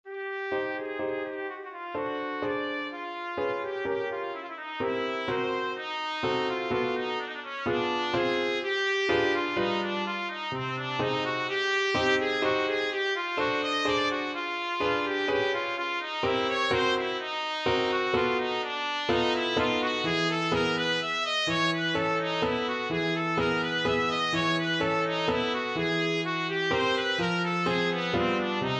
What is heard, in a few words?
mazurka, trumpet; mazurka op68 no4